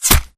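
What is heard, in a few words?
impact bullet generic headshot human; Titanfall 2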